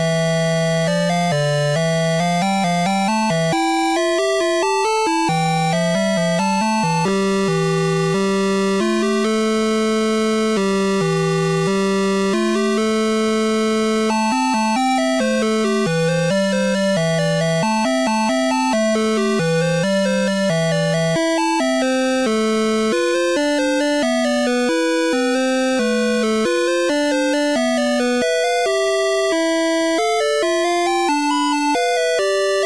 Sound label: ghost town loop